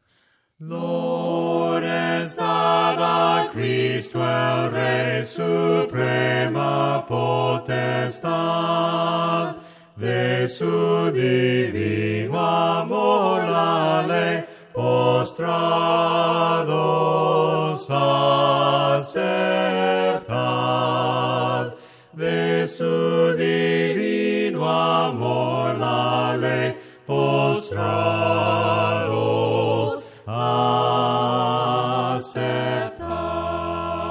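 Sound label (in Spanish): LOORES DAD A CRISTO EL REY VOCES, himnos a capella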